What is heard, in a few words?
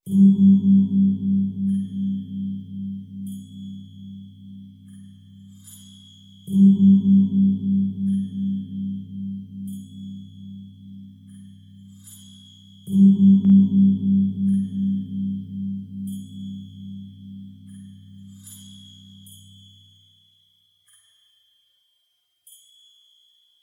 Percussion; Age of Conan Hyborian Adventures; Ghurnaki Invaders (Enemy in Pursuit - Cimmeria Percussion)